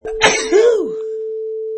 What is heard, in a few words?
Sneeze